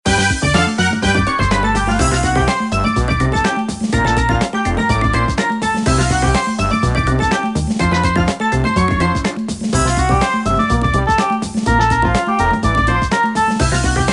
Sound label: Slow and Steady; MP6